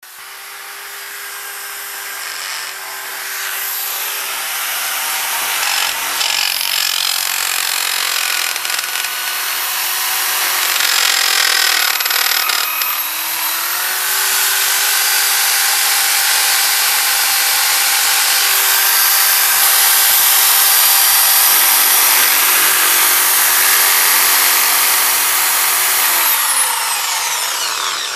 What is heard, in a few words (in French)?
Perceuse